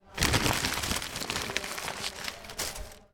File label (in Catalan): Paper Arrugat